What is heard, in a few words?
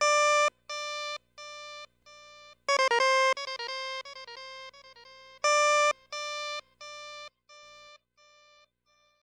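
synth 88 D minor; Hip Hop & RnB 3; Sweet Jasmin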